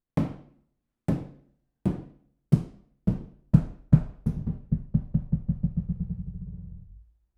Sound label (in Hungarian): pattogás, tömör gumilabda; perkupa